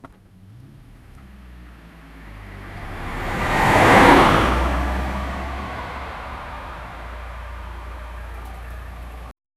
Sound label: a car crash; accidents